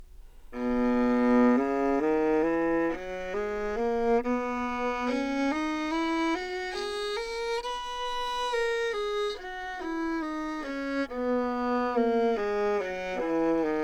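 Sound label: baroque viola scale slur, Anechoic; OPENAIR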